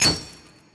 Jewel Sound

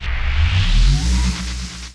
Dark Elec Spike Ready

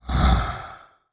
medusa walk

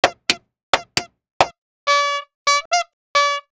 handclap

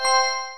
whisper

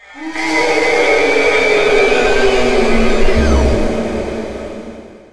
Roar; Kundun

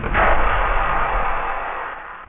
Drakan Attack

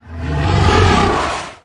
blood die

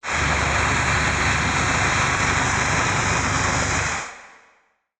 mystical attack

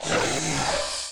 Soldier Attack

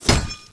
Melee Hit

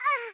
Molt Die

